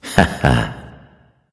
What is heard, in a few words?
heh heh; smite; vamana